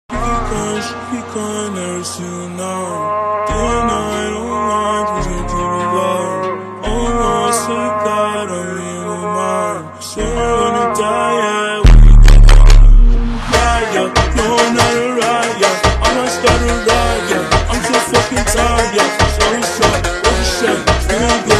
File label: FUNK Ultra Slowed